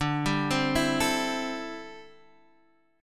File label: D 7th Suspended 2nd Suspended 4th, Guitar, Standard; arpeggiated; JGuitar